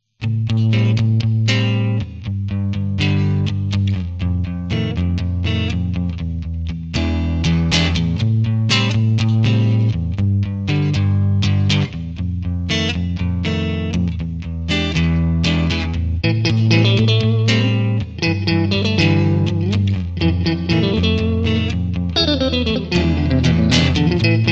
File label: Songs For Guitar